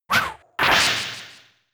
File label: Fling; attack moves; Pokemon; Gen 4; DPPL HG SS